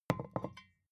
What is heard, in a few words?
vodka put down tipping hard